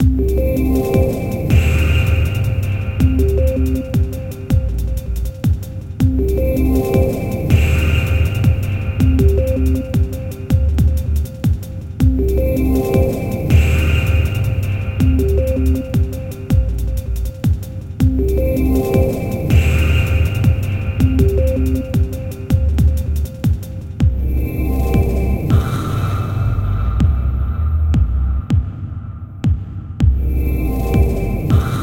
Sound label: Space DnB